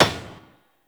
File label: Debris Metal Stone; Warframe; Entrati; Ambience